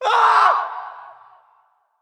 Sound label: death